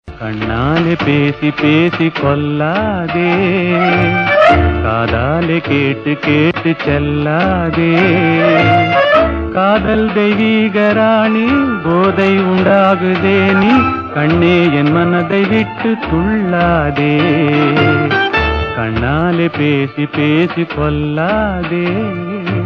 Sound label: melodious tone; ringtones; sms